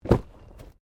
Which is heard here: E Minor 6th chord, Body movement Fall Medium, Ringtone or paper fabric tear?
Body movement Fall Medium